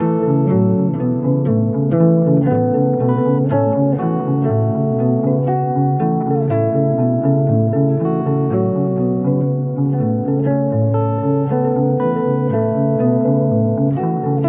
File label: explosions; twentilos